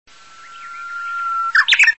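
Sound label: uguisu, Japanese bush warbler; isigaki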